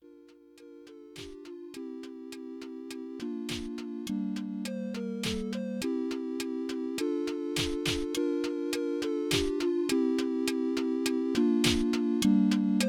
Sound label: game over, music; game over screen